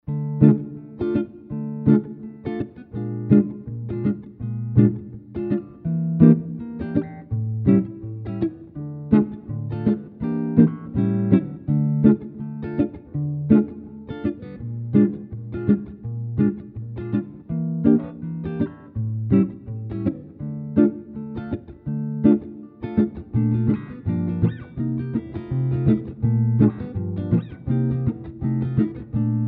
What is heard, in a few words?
Basic Comp, jazz tunes